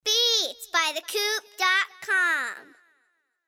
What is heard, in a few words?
kid efx